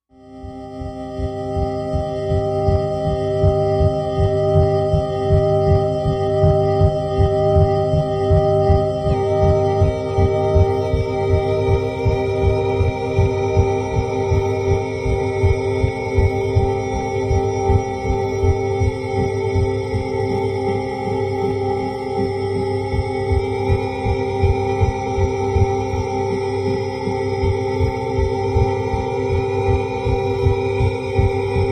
Heart Beat, musical

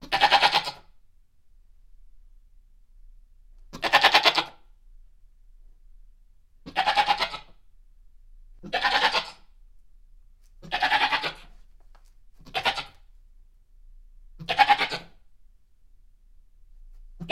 Goat Multiple Bleats

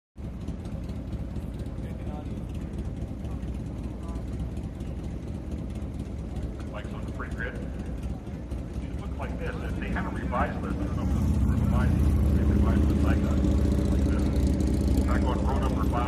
Motorcycle Race, road racing

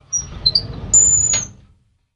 Drawer Metal Open Qu

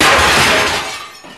Glass Break Hard Imp; windows & mirrors

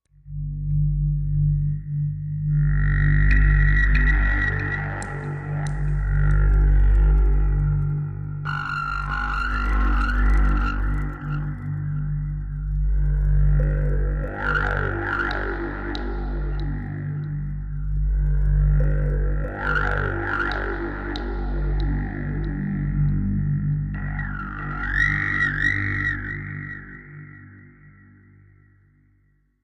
Drain Life Slw Low Vibra, energy beams

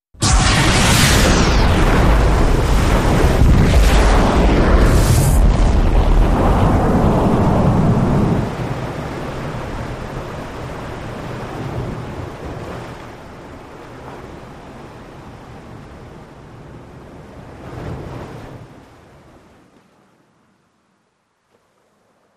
Explo Surface POV, depth charge

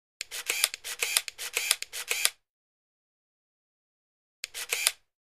Camera - 2 Effects; 35 Mm Still Camera Shots.

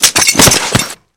Body Falls Heavy Glas

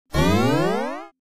Dual Tone Button, buttons beeps

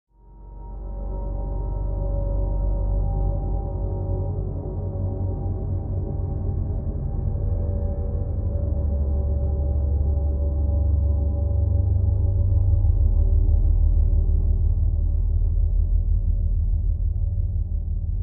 Bed Galactic Drone

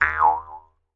Jews Harp Funny Boin, cartoon; cartoon fx

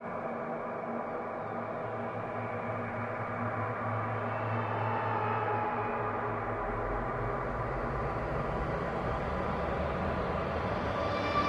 Scream Slw Rising Amb To, evolving

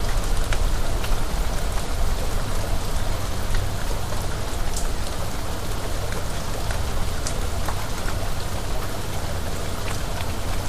Rain Droplets, light

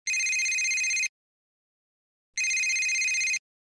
Telephone Two Cell Ph

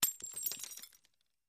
Glass Impacts Debris

